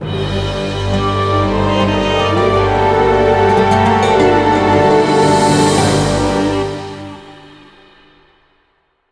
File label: Lose; modern combat sandstorm